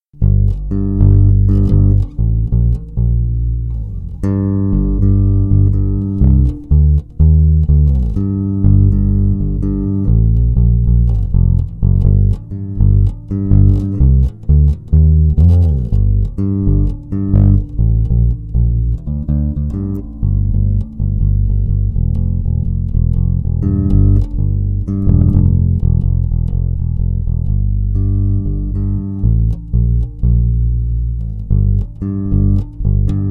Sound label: bass sound sample full eq, bass guitar; weigraff